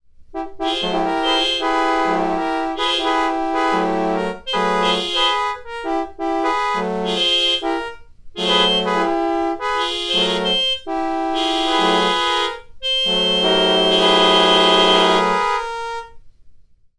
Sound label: traffic jam